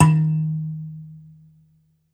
BASS KALIM, Kalimbas; E-MU Producer Series Vol. 7 – Old World Instruments

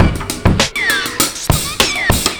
100 bpm, breaks; Zero-G Beneath the Planet of the Breaks

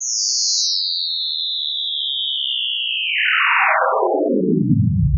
sci fi; Masterbits - Switch FX

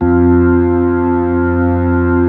B-3, Keyboards

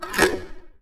shut; AKAI; FX SIGNALS

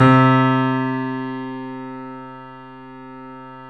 C, 3-D Audio Collection, 3D Pianos, Yamaha C7

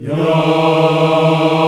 VOX African Yas; Roland LCDP11 Africa VOL-1

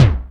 JD800 Internal Drums, Roland JD800

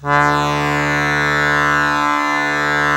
DIATO1 D1, Historical Instruments; USB Soundscan vol.23

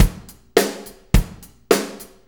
4-4 Loop, Drum Loops, Full Kit Loops; Drum Tools

